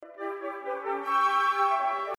Ashibue

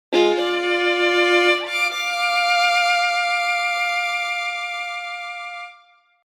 legato poly sound

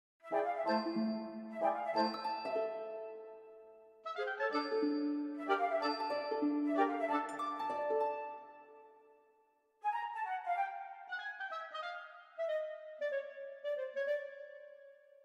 wood

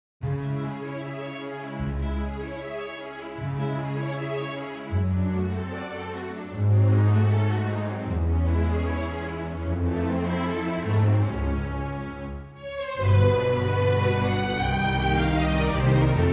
strings; Poulenc

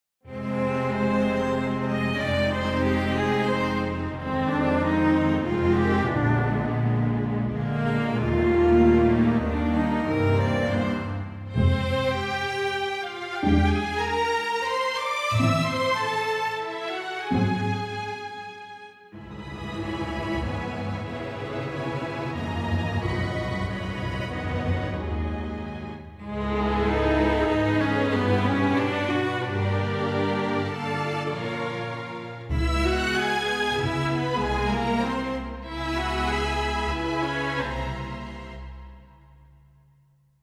strings; Poulenc

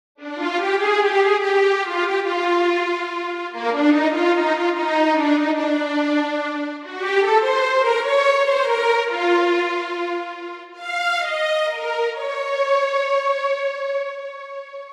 perf legato sul G sfz

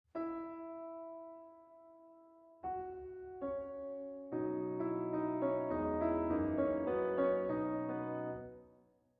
Steinway Soft Pedal On

